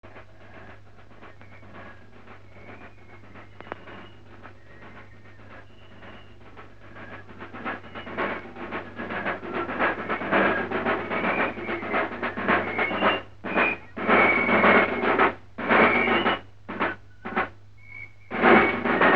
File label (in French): Tambours et Pifres; Reichsmusikkammer; Die Hitler-Jugend